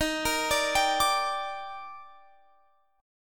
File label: D sharp Major 7th, Guitar Open F; D#M7; arpeggiated